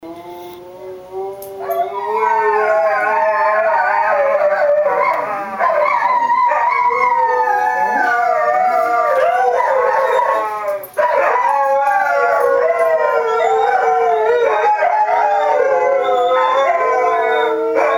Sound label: Dog Talk